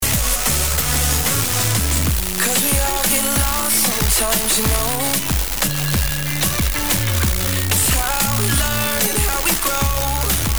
splatter killer test 106.5MHz bw 140kHz on, wideband fm; splatter killer for wideband fm